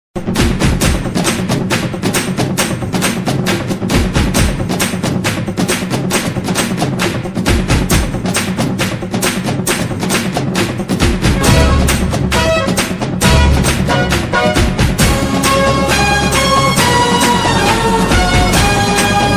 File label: mass bgm ringtone; sandakozhi